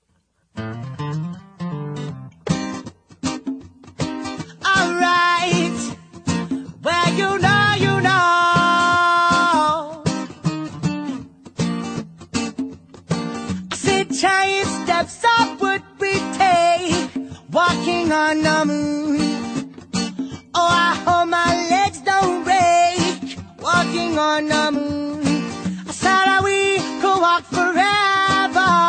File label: acoustic